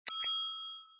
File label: Exclamation, Windows; Whistler